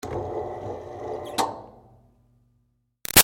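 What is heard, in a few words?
Projector Screen Close Up Down Short; Offices; Big Room Sound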